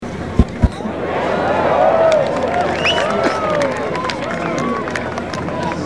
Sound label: sonic boom; X-Prize